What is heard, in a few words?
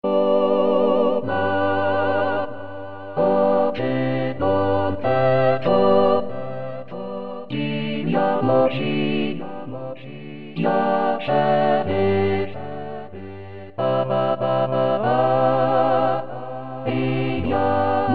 Tutti; Eco de lassus